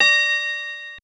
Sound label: Bell D6